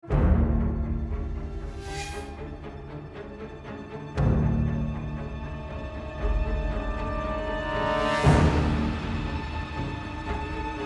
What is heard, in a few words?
Togakure dramatic